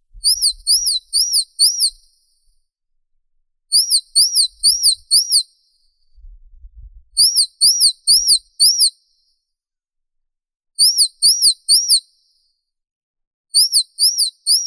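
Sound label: azure tit Cyanistes cyanus, nature sounds; lazorevka belaya; knyazek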